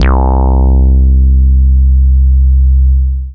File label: Single Electric Basses, Dance Floor Bass